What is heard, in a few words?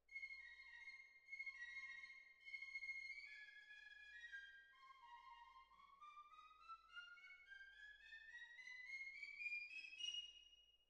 Cbasses